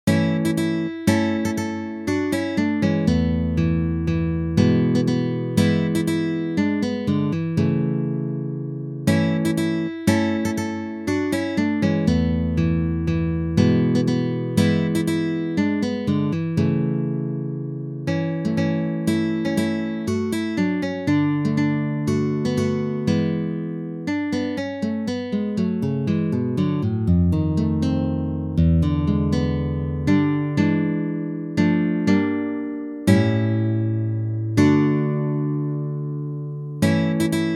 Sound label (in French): pour guitare; Salvezza